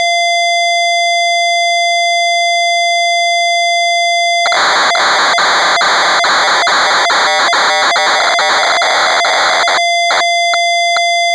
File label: BUZZARD